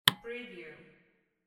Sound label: light switch